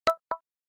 letter, honor classic

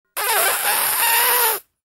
suction

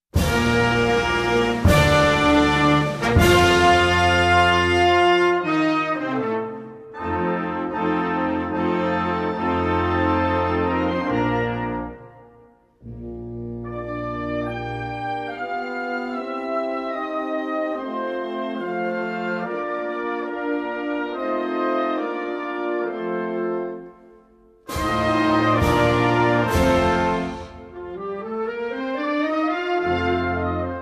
anthem

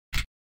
keypress delete

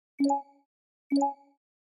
warning; oneplus

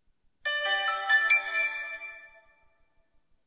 pianola, blackberry bold 9700

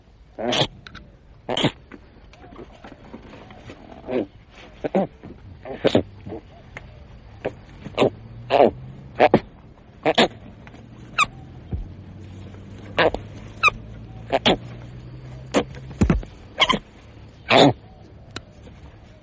koala

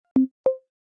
facetime imessage join; Системные звуки и уведомления iOS 18 (iPhone 16, 16pro, iPad)

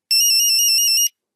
mosquito, nokia 3310; zvuk nokia 3310

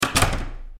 door close, undertale

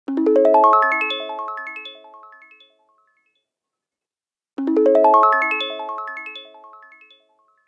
nightstand alarm; apple watchos 11

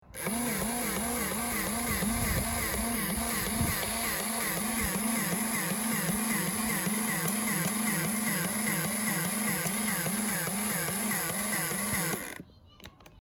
hydro blaster, vodianogo pistoleta

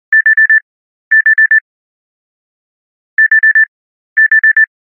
galaxy watch digital beep

